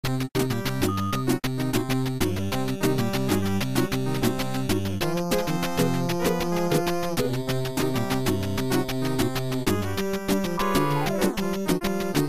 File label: 8 bit; spider-man